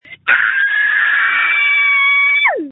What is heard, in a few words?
screams